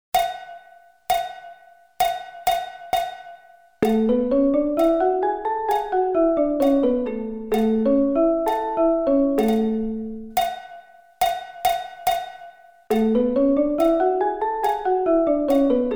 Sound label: A Scale